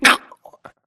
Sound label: grunt death neck; Titanfall 2